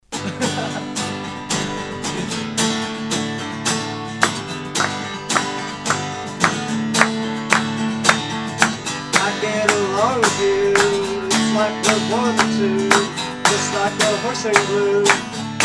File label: Clapping; Clapping Buddy